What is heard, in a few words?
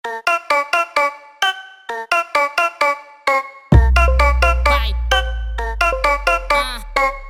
beat